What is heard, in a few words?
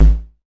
kick, drums single hits; iElectribe Kicks